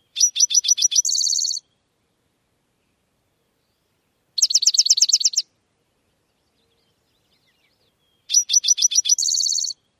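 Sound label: songs, Spotted Towhee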